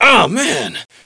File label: pain, player; SINDEMO; blade